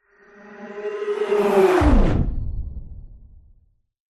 science fiction pass by whoosh fast into another dimension; zapsplat